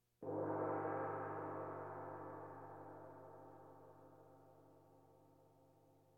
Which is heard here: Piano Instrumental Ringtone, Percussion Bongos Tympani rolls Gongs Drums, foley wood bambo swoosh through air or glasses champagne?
Percussion Bongos Tympani rolls Gongs Drums